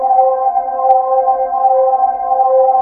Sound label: POLY SPACE, Korg Poly-6